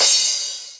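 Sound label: cymbal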